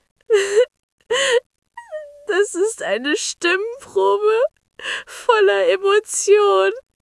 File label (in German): Weinen; Stimmen